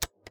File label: Mechanical Switch